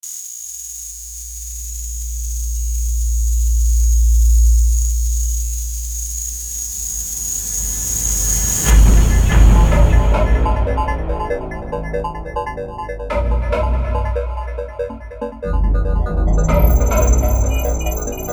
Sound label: noise session